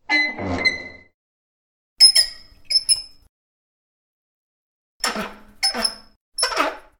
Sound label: Closing Valve; Don't Be Afraid The First Toy